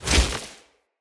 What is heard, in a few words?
Casting Device Cast Right; Weapons; Player